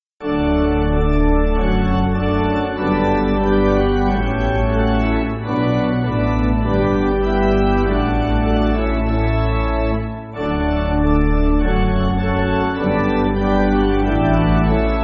Pipe

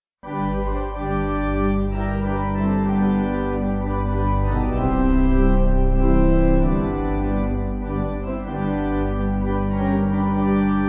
Pipe

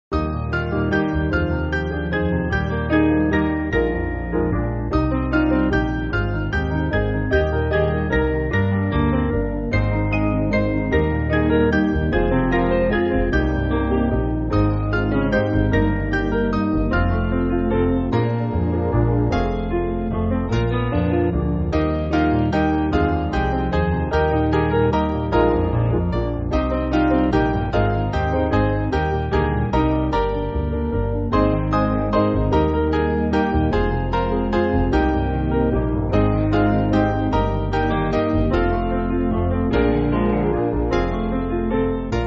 Who Is The Baby Aye Carol Piano

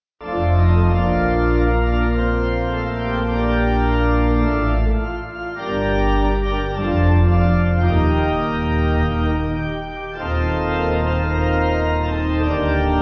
Pipe

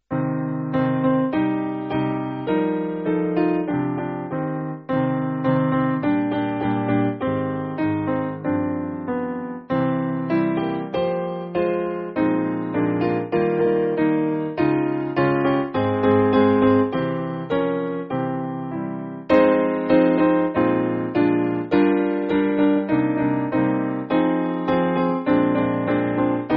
When God Almighty Piano

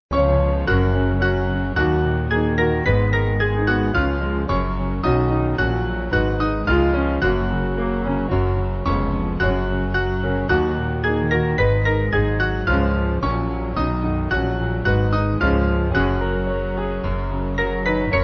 Piano

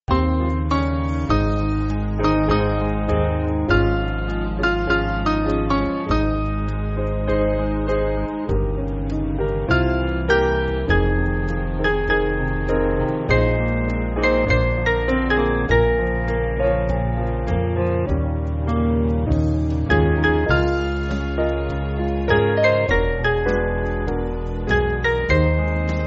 Piano

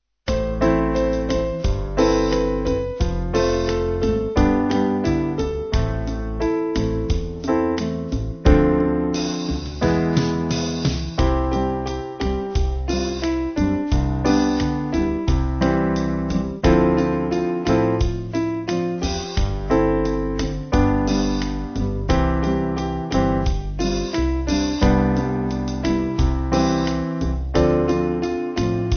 Band

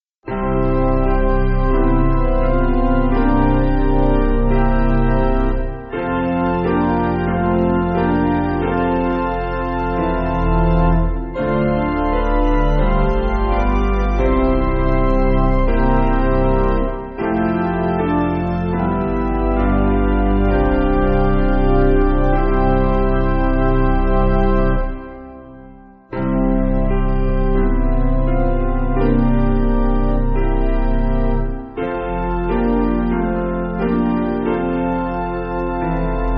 Piano

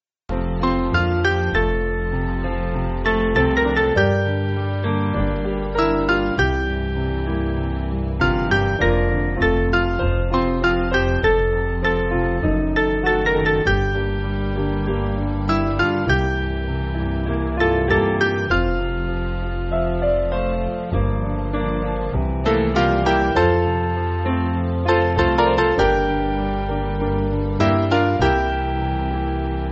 Piano

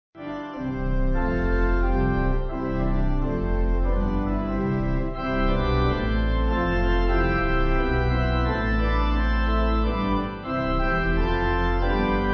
Pipe

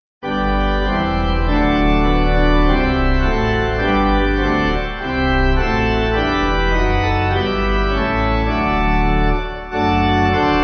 Pipe

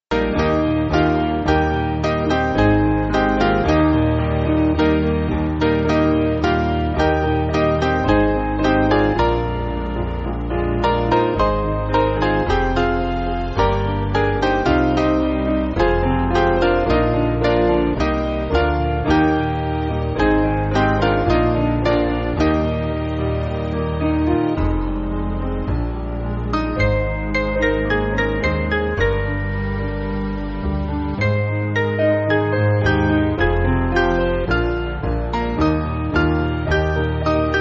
Piano

